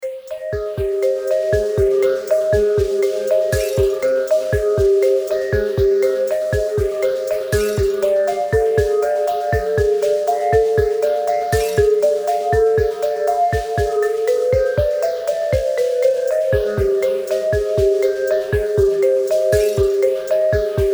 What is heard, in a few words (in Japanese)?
ミステリー・ホラー・探索パート｜不穏な感じムンムン